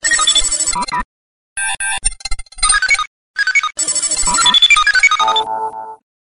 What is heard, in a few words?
Computer Communicati